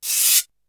Shake, Drums; ZERO G - Datafile DISC 3

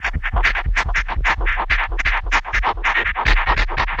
Geophone, Environments; Sonic Foundry (Sony Creative Software); Myths of Technology

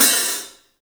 3D Drums, TIGHT ZILDJ; 3D Sound

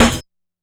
snare, Hardcore Breakbeat 134 F#min; Big Fish Audio; Hardcore Breakbeat Action; Trancefunk Mutator

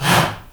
HA, SHOUT, Heart of Africa

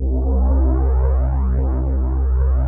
JUNO STR

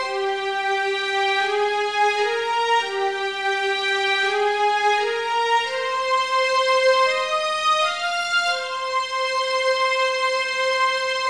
Strings, 70s Analog Funk; Sonic Foundry (Sony Creative Software); What it Is! 70s Analog Funk; Session 15 - Wild Child

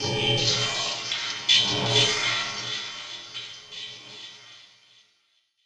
X MACH, MACHINES, Production Effects

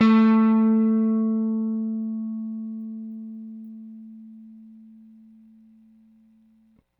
Rickenbacker Bass; Airwindows

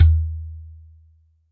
Udo, Percussion, Yamaha DTXpress

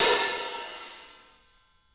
Hi Hats; InVision Interactive; Lightware VOL-1

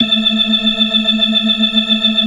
FAST VIB, ORGAN, TREMOLO VIB

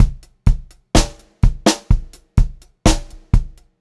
126 POP BT, Pop-Rock Drumloops; 126 POP 4

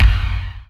BD, DR BD; Best Service Gigapack 2

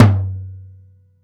TOM D, Drum Dry; East Collexion; Drum 1

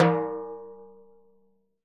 TIMBHI EDG, Synclavier Percussion; ILIO; Synclavier Percussion 2; World & Orchestral

